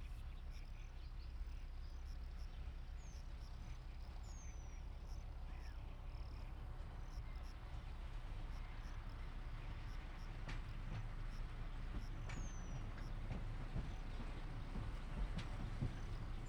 elhalado, jobbrol balra, kulso vegallomas, kisvasut; hortobagyi halastavak

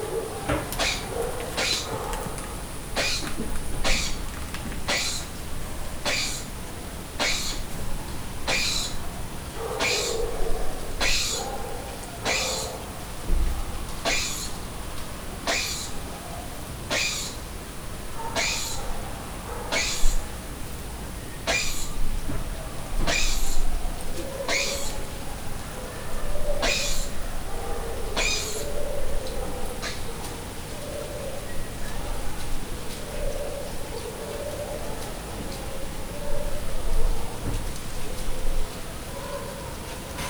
egyszer csak abbahagyja, nagy pele; allathangok; termeszetben; perkupa

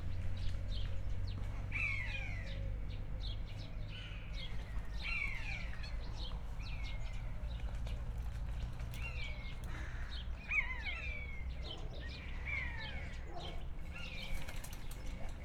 fekete holló távolban egerészölyvek; Hortobágyi Nemzeti Park 2013

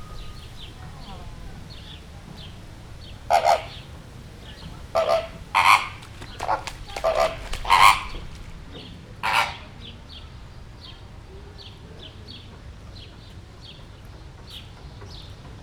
többen háttérben szél, rózsás flamingó; Győri Zoo 2014

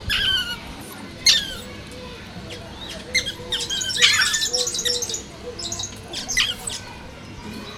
civakodnak, tengerimalac; szegedi zoo 2011

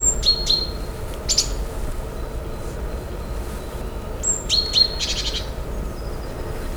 egyik hangja, széncinege; termeszetben; allathangok

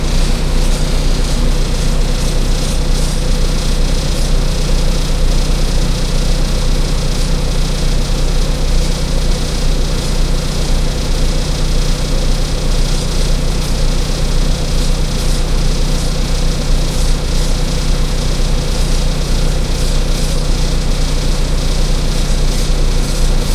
hatvanas busz utaster enek hatulja

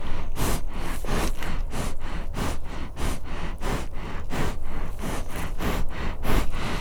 liheges, lo; állathangok; paraszt udvar

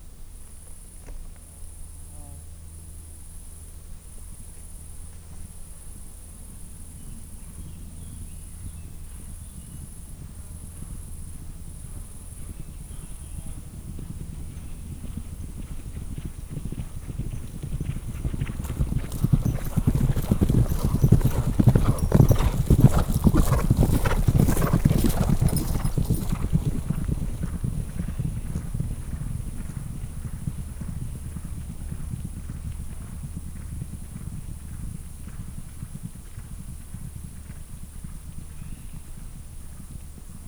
vagtato csapat, lo; állathangok; paraszt udvar

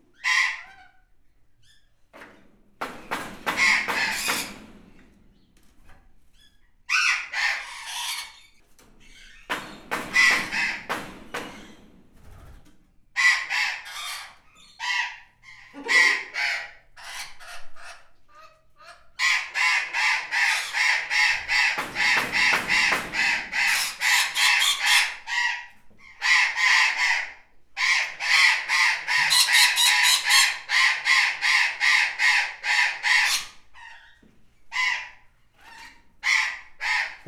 lármásak, sziklapapagáj; debreceni zoo 2012

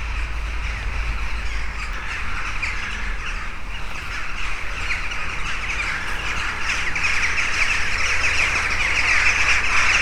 seregélyek és varjak; Hortobágyi Nemzeti Park

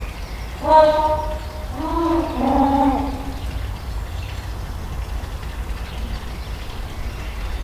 kicsit megerőltetik magukat, pápaszemes pingvin; marantz puska; debreceni zoo 2012

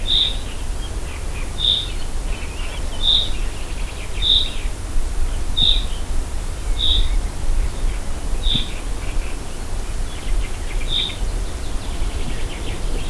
riasztóhangja, erdei pinty; termeszetben; poroszlo

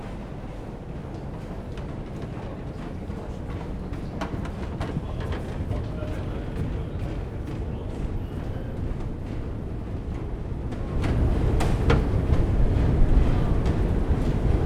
metró 3 mozgólépcső, Deák tér, éjszaka Budapest

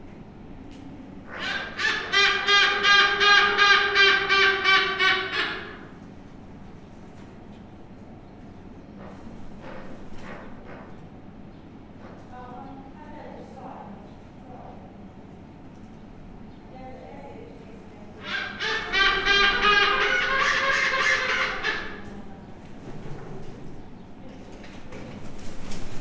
törpeflamingó és az állatápolók; debreceni zoo 2012

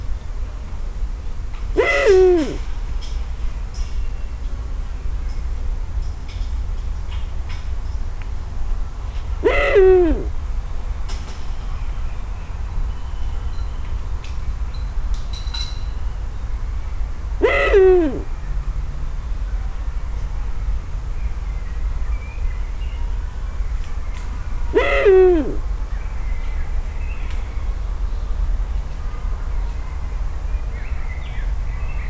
a végén egy elszálló egy, uhu; szegedi zoo 2011

